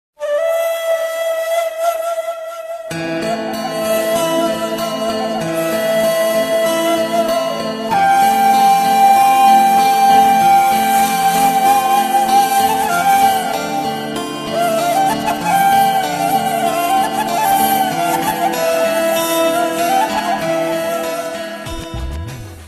arab flout

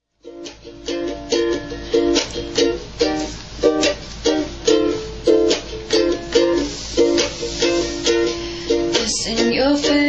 lullaby

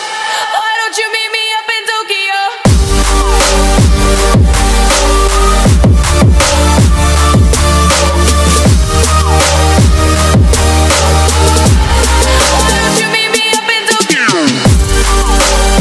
Trap

